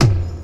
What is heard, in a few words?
old school short reggae tom drum single hit b key